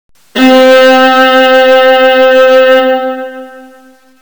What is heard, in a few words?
violin, tremolo